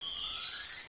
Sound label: Special star; mahjong tianjin